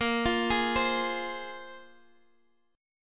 A sharp 7th Suspended 2nd, Guitar Half Step Down chord